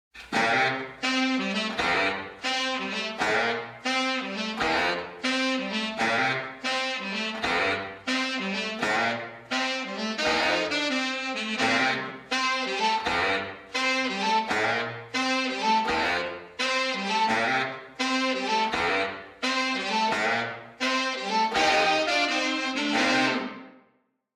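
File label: sax wet, ensemble reverb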